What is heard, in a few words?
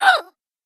die